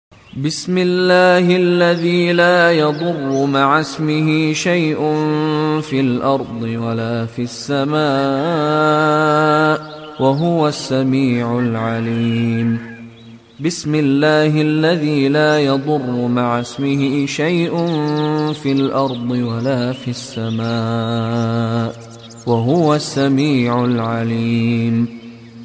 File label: bismallah ringtone, islamic ringtones